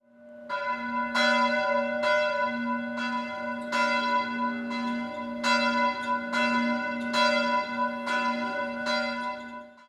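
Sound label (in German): glocke mit zu kleinem klöppel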